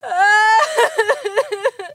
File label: sadness